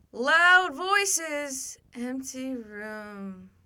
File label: loud voices empty room